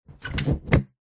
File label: foley balloon inflated short handling squeak; zapsplat